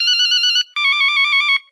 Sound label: Phone Ringer